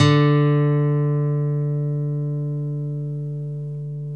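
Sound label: GTR D3 AC M; Synclavier Sampler Library; KEYS AND GUITARS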